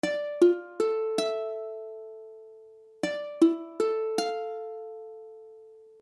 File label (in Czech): Dmadd9, akordy ukulele